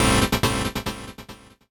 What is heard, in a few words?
KEY SHOT TEKNO 140 A; Techno2; Singleshots